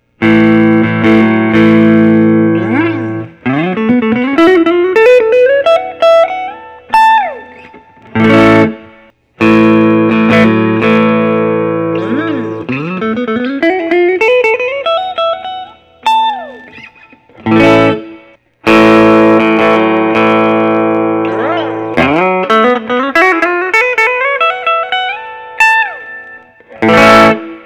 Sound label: gibson custom 60 les paul special